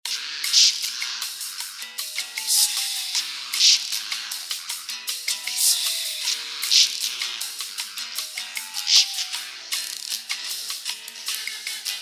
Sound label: Elevator Music; Mass Effect